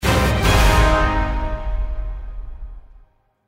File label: Opponent Found